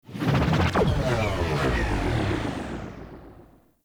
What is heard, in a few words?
Ship Laser Whip; Warframe; Corpus Ship Remaster